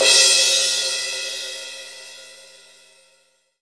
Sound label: CYM, KIT Real-Kit; 90 s Sample CDs; Sound & Vision - Gigapack I CD 1 (Roland); Real-Kit s10